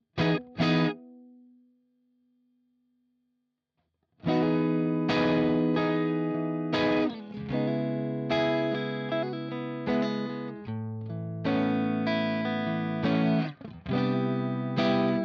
Room low; UA Lion

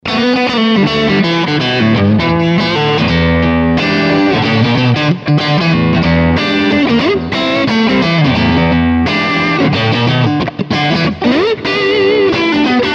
Fender AM Orig. 60 Neck Crunch

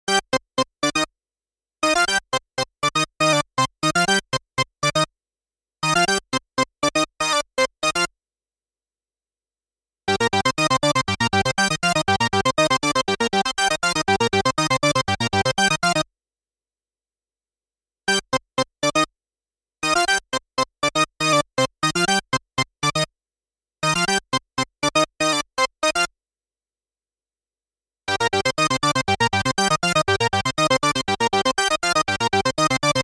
Arturia M12V Funky Lead 120bpm C

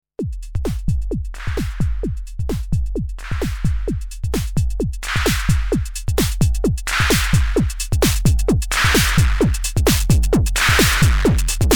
Akai Tom Cat Overdrive; Maul

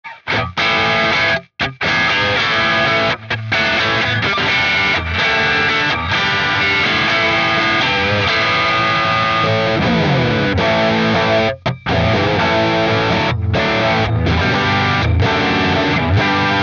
Crunch Riff; Archetype Petrucci

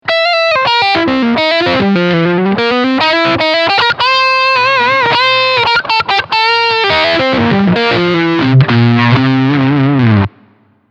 Single Coil Crunch

